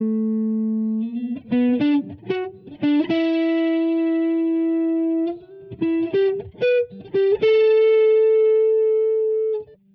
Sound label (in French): Guitare 2 fois plus lent